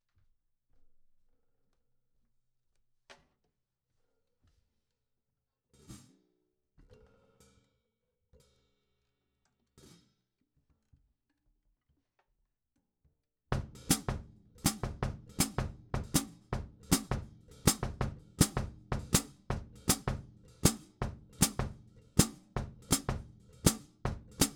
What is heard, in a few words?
perc bridge hat